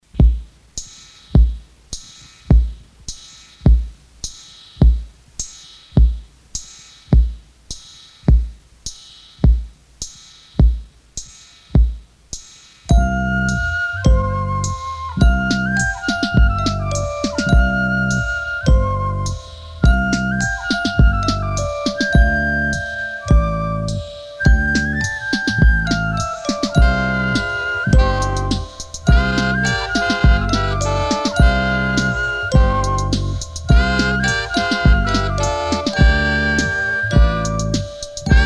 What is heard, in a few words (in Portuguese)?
marcha abertura